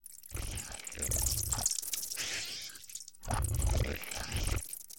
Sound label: Ganglion Eater, Vocals Humanoid; Sony Pictures Sound Effects Series Volumes 1-10; Sony Creative Software